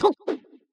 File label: jump; sasuke